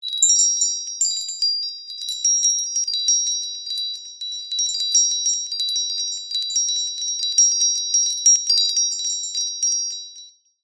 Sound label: household wind chimes metal light movement chimes; zapsplat